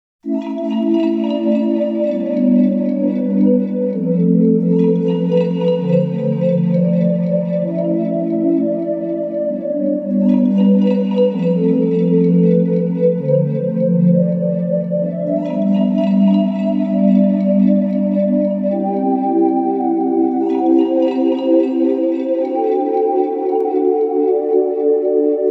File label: illusionous delay bell; genso no direi beru